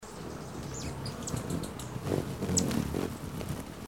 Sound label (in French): ariane de francia, chant